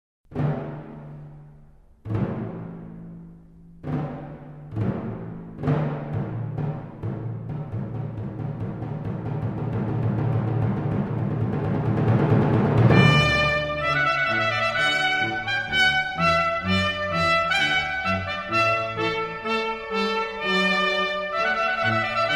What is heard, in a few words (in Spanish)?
Trompeta Voluntaria; J. Clarke; salida